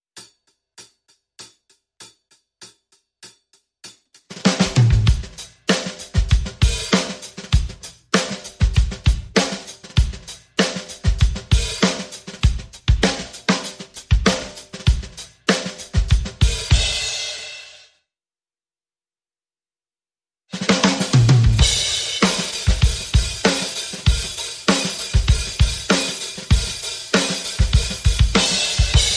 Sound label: Drums Only